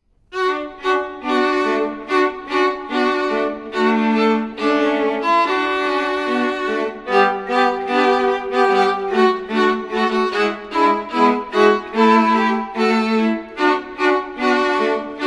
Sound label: Jingle Bells Viola; Community School of the Arts